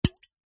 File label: soda can tin empty bash slight wet splosh